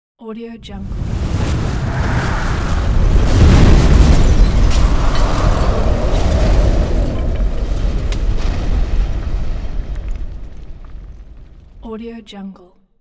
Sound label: bomb explosion